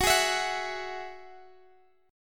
Gb 7th Suspended 2nd Gb down Guitar Standard; strummed; capo 9; chordshape

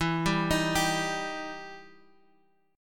E Minor Major 7th Guitar Half Step Down

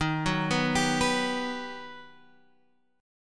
G Augmented Major 7th

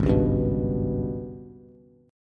Ab Minor Major 7th Double Flat 5th, down, Bass; AbmM7bb5; strummed

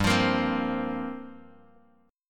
G Minor 11th, Bass Standard; strummed; 15,20,20,17; JGuitar

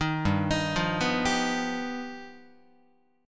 G Augmented 7th, Guitar; G+7 arpeggiated; JGuitar

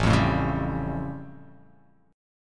A Augmented 7th A; x,0,3,2,1,2,x,x

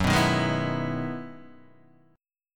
E Major 9th F sharp; strummed

